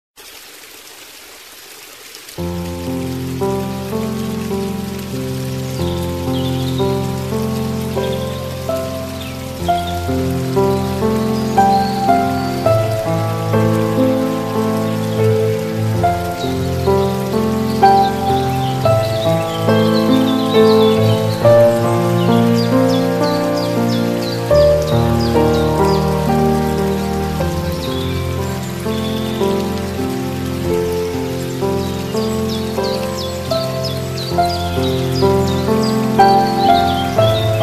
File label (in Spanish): musica de relajacion pajaros agua bosque relax music birds water forest